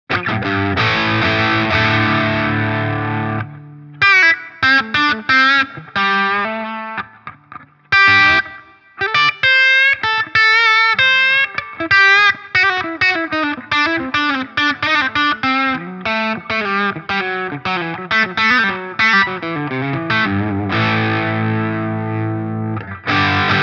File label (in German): KALUNA PRS Steg Gain 11.00h; Vahlbruch